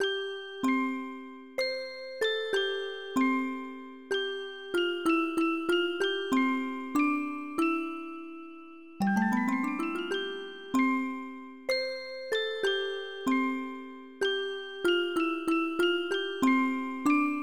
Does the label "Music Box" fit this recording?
Yes